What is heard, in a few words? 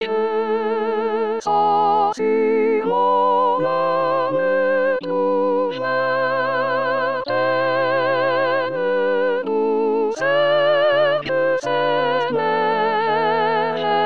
soprano